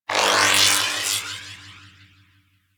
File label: Shield Electricity; Warframe; Volt